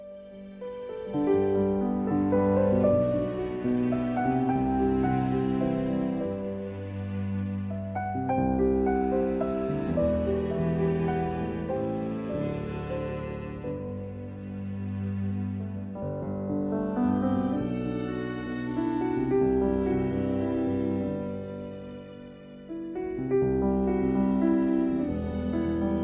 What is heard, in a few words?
ding dong medley